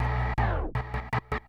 Industrial 160

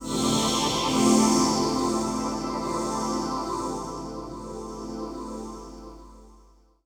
SPACE PAD 140 A; SpaceSounds

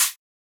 hat; Anthemic

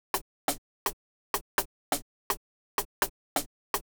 HOUSE PERCUSSION 125

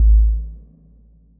Bass, One Shots; Touch Loops; BlissedOutBeats

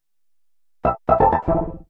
HOUSE FX 125

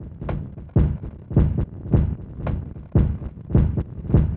110 Driven, Percussion (Stereo); Loops; Touch Loops; ElementsOne; Abstract Rhythms

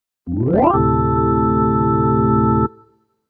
DEEPHOUSE ORGAN 125 A; Keys

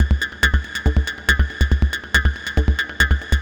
TECHNO EXTRA 140

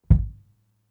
Kicks; Touch Loops; CosmicFunk

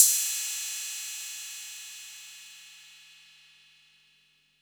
808 CYMBAL, TR-808 DRUMS

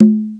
loco, percussion; drum

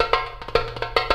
TURKISH 109, percus loops

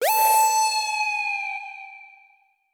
SPACE SYNTH 140 A; SpaceSounds

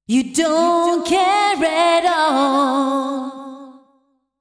deep house female, 125, A, vocals

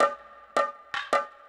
PERCLOOP ETHNO 160

